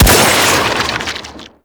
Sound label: Infested Sniper Rifle Shot; Weapons